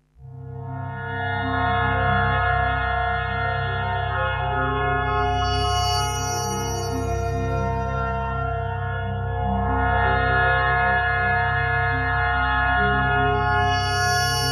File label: ambient